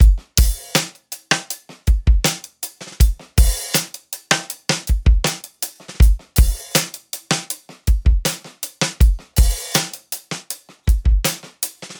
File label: acoustic drums dry, no airspace; airspace hybrid reverb and delay; modeaudio